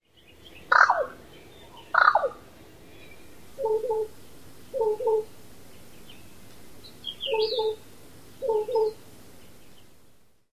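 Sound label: crow calls; cracko; bell calls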